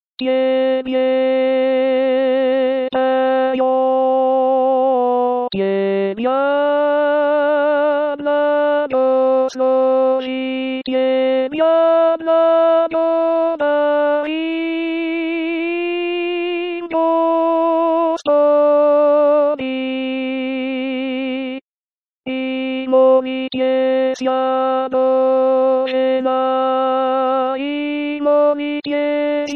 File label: Alto